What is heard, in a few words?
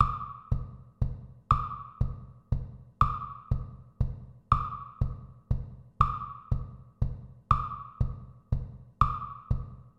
two level triple metre dots; organisation of time metre